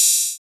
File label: open hat, rage distortion